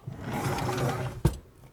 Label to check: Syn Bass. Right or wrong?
Wrong